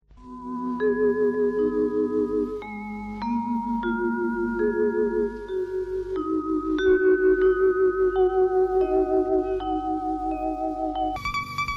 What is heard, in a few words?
news jingle; 1984; communist